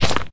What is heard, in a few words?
mud footstep